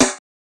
00s Long Steel Snare Drum Sound C# Key, Royality free steel snare drum sound tuned to the C# note